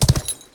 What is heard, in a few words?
charge, horses